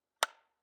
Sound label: Light Switch